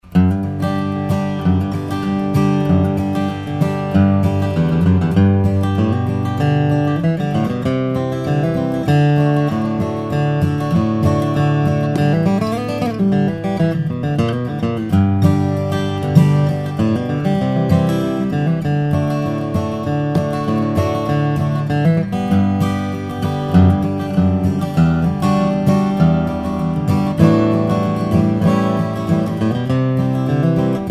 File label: haight art deco dreadnought, steel string guitars flattop